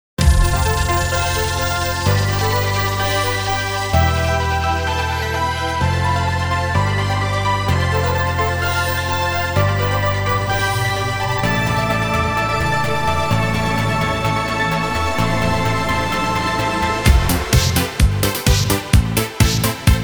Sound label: karaoke